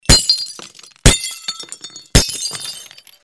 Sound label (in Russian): Звуки бьющегося стекла посуды бутылок окон